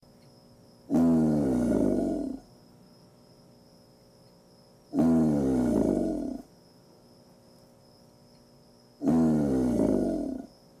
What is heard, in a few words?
alligator bellow